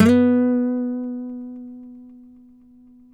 NYL B, FLAMENCO NYL; Spectrasonics; Hans Zimmer Guitars VOL-2

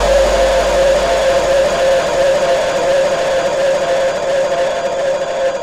FX, Futurist Drum & Bass

